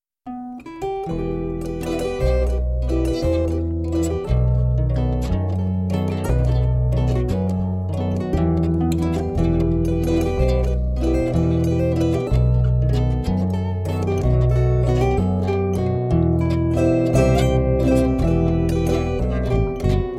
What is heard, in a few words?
Zither